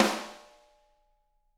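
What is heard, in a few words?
snare drum; Bob Clearmountain Drums I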